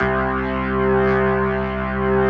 C2S, B3

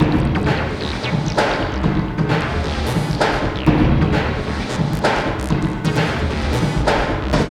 LOOP, Tekno Industrial; East West

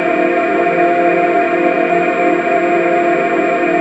F3 glas vo; Northstar - The Wizard VOL-1

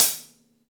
V-Pop Closed Hi Hat, Roland BK-5

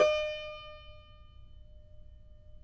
Cumbus D#5; Spectrasonics; Hans Zimmer Guitars VOL-2

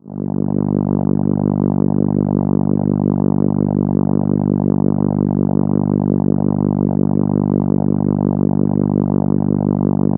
Contra G3, ARP Solina

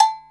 agogo hi, Yamaha Motif ES drum machine; 300 Drum Machines; Yamaha Motif ES-Series

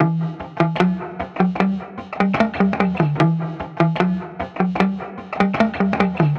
150BPM Guitar Clean E min; Antidote Advent

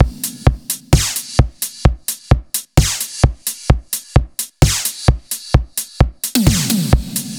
Beat Stealth; Zero-G; Future Wave

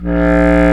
WND CB Clarinet; Roland LCDP04 Orchestral Winds; Lo Clarinets

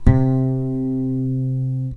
DRY BASS, Electric Basses; PlatinumPhattCD2; E-MU Producer Series Vol. 8 – Platinum Phatt

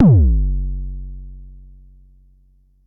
Bassdrum; Jomox Xbase09

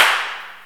clap, Drums & Perc; AKAI S6000 CD-ROM - Ultimate

